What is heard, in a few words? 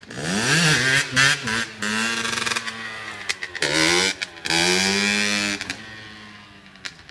wheelie impennata